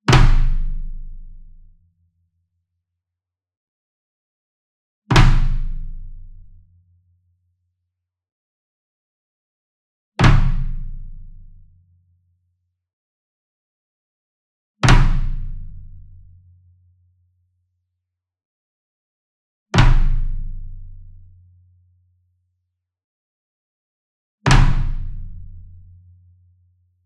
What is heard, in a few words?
Bass Drum Marching Flam Hard Beater Near; SINGLE DRUM; boom library; Cinematic Strikes Construction Kit